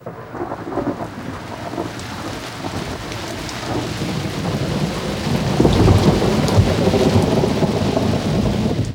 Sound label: THUNDER; Best Service - XXL Production Effects